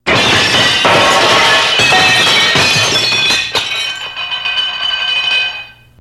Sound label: Glass Break Impacts; windows & mirrors